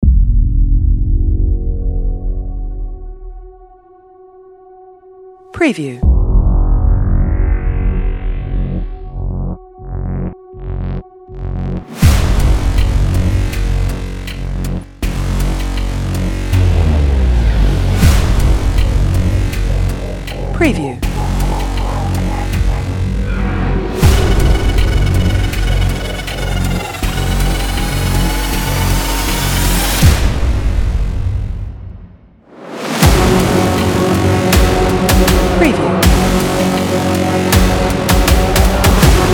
Cinematic Countdown Timer Ticking Clock Action Trailer Intro Short